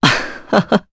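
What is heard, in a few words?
Vox Laugh Japanese; voices; heroes; Pavel; kingsraid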